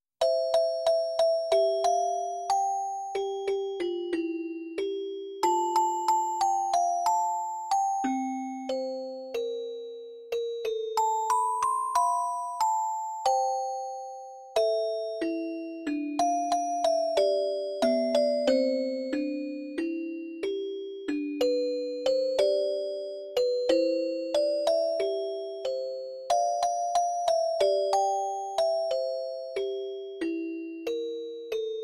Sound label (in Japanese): orgel; 「つがる市民の歌」：オルゴール